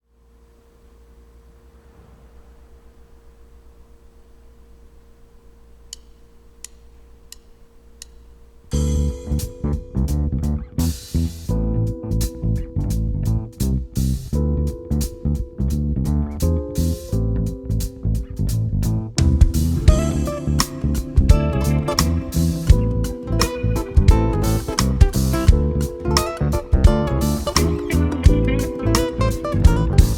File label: Akustik